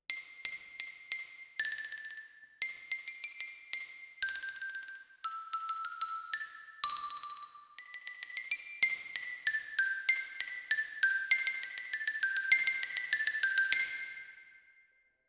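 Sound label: Xylophone Close, Jazz for piano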